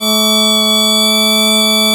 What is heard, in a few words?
BP BASS D3, Celtic; USB Soundscan vol.42 - Celtic Flavours; AKAI